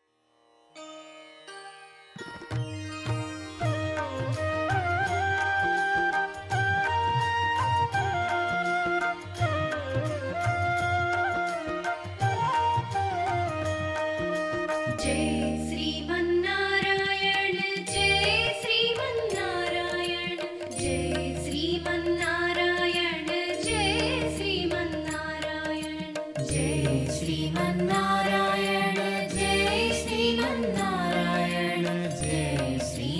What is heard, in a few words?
Music; SriRamSchool